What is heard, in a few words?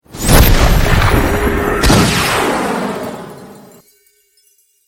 archer guided arrow cast; criminality soundpack